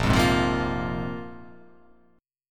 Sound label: Bb 7th Suspended 2nd Bb down, chord; Bb7sus2; strummed; JGuitar